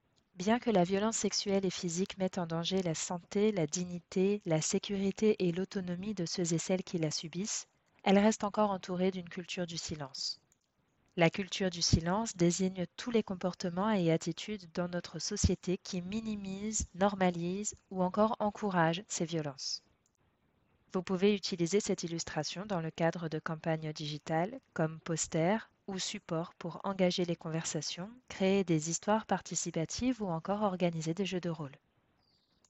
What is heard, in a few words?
viol; Français